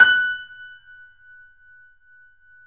3D Pianos Yamaha C7; E-MU Producer Series Vol. 5

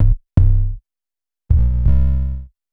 Synth Drum; Sonic Foundry (Sony Creative Software); Ma Ja Le Ethereal Textures; Beats

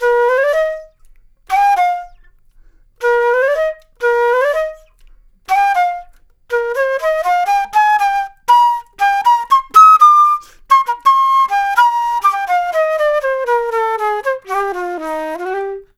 Flute Solo, Jazz; Sonic Foundry (Sony Creative Software)

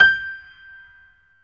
PIA PRO, Roland

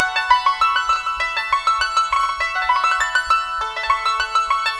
Yangchin 100 A; Sonic Foundry; Hip-Hop Street Beats Construction Kit; Opium

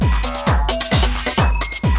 TEKNO JAM LOOP, breakbeats

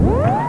oiiiiinnnnn; Urban Shakedown

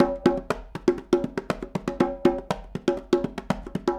Congas, 70s Analog Funk; Sonic Foundry (Sony Creative Software); What it Is! 70s Analog Funk

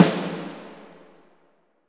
snare, Roland; LA Composer

